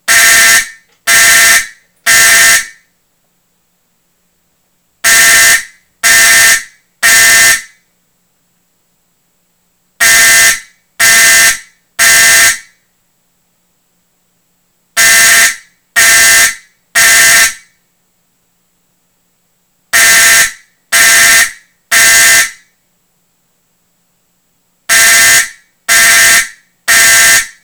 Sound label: Simplex 4051 Code-3